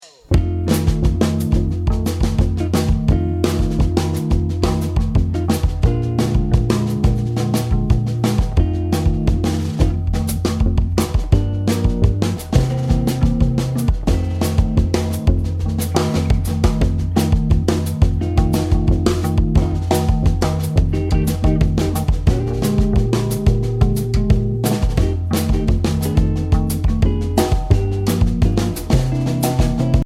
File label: Jazz Chords, Bass Guitar